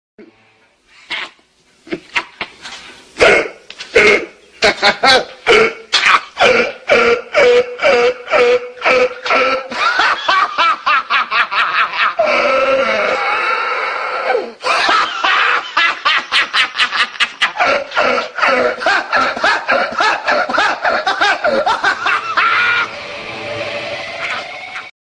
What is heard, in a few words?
incredible laugh; tom hanks